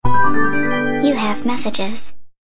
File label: SmS Fresh, ringtones